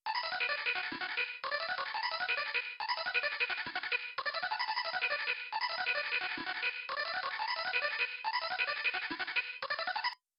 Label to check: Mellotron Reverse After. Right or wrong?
Right